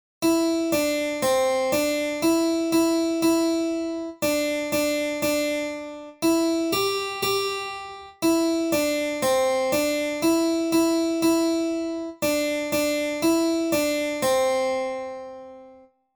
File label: Timbre Harpsichord Melody